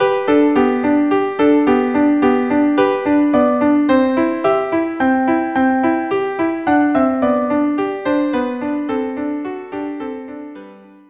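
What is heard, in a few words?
violin violin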